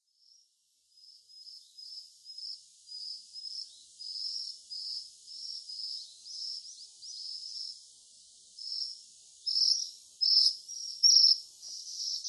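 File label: Ryukyu minivet, yambaru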